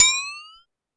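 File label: Bell Up, Drum Machines Clavia DDrum-AT Pitch Envelope; 300 Drum Machines; Pitch Env Various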